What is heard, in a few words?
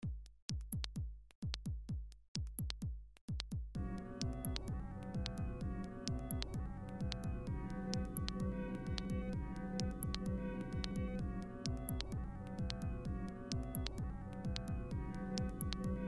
instrumental; 2006 glitch instrumentals